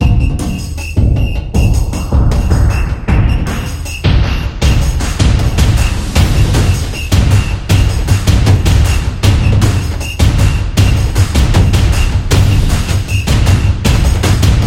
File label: Festive Beat; action sport